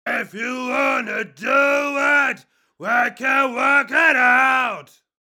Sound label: growl; CVT